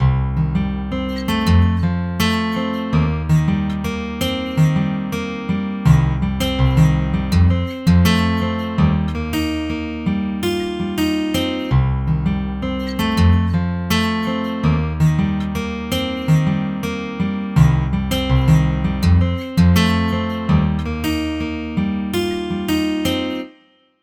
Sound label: guitar